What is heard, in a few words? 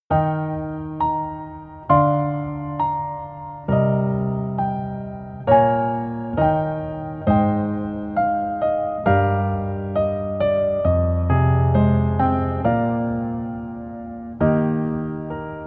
Piano